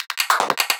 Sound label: Beatbox Fills 150, Hybrid Beatbox Drums; Antidote Advent